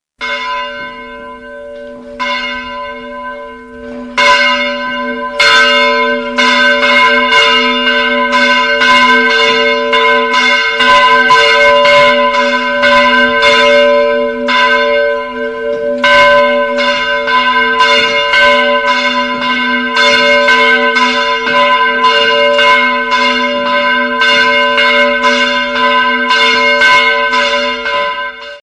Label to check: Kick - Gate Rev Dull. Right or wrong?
Wrong